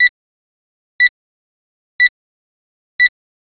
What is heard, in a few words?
mulch timer; timers